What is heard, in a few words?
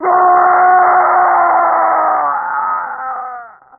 impaled scream; armageddon; scorpion